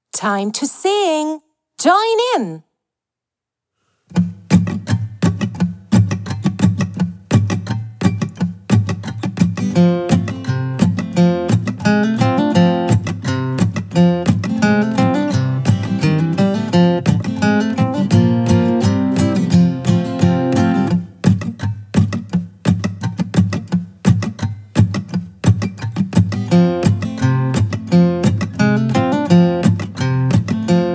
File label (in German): englisch song karaoke; ich und du